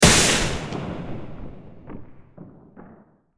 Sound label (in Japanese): 雷